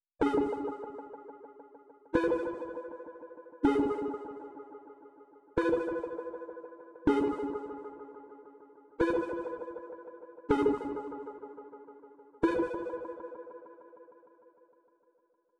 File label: 140 BPM C# Min Blips; Loop Stems; Cymatics; 2022 Melody Collection; Wavy; Drift Away